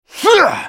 spellcast effort; Talon; en US

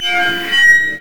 brazier chains groan

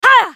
spell cast effort, en US; Riven

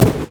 wraith basic attack; LoL; monsters

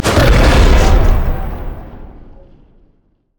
battle roar; rengar

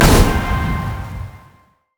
smokebomb; LoL; akali

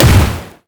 satchel charge; LoL; ziggs poolparty

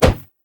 minotaur critattack; LoL; alistar

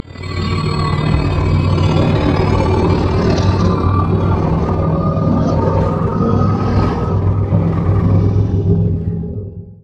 savagery loop; rengar

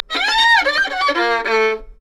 emote taunt; LoL; twitch

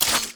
Basic Attack; orianna

